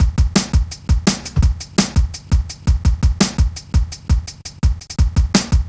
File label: Step Ez, Drum Loops, Jungle, 168.5